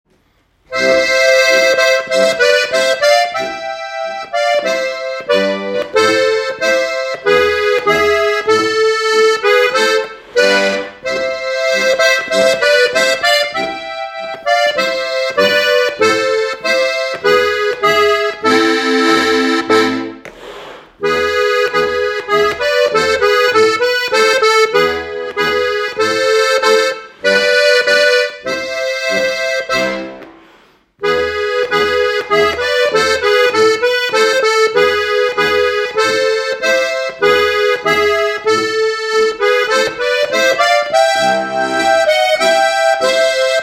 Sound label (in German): Ukrainische Nationalhymne